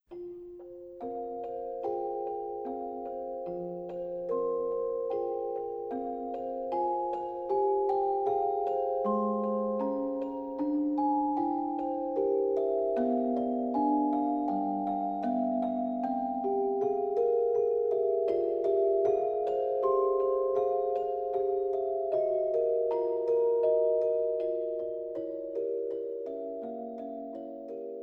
Tanz der Marionetten – Dance of the marionettes Celesta